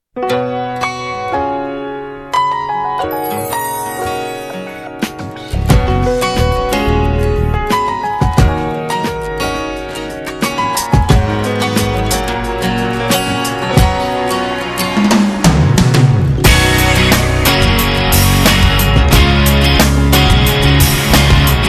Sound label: Instrumental Version